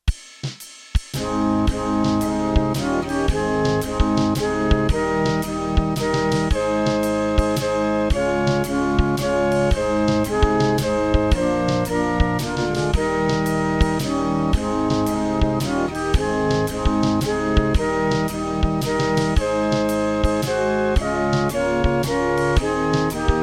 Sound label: To God be the glory